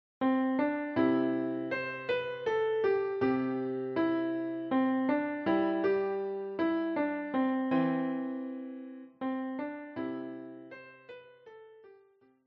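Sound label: The Last Rose of Summer, piano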